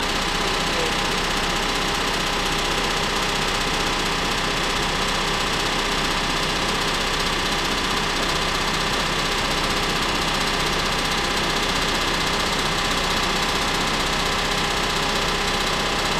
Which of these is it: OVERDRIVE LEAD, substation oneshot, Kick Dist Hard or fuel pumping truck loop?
fuel pumping truck loop